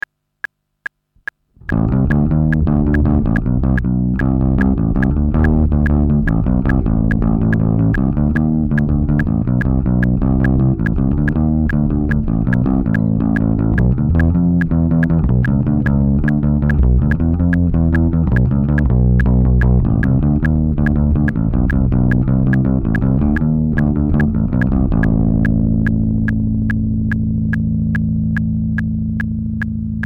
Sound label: Bass OVD; SamplePreamp; DT4-Bass